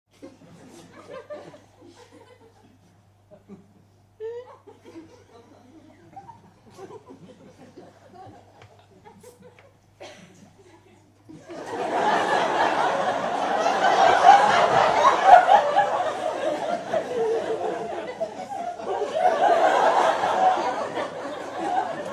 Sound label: Few Short Laughs And Mighty Laughs